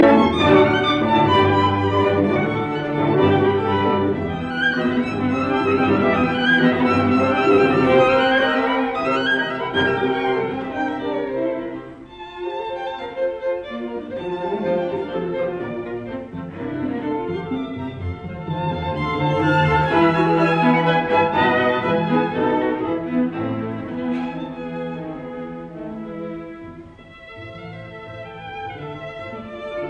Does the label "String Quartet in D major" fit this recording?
Yes